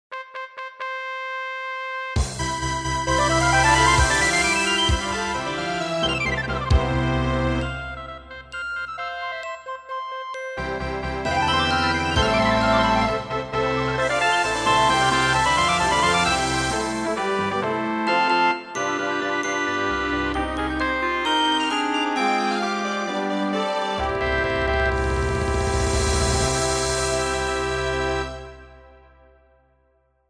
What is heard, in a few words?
Fanfare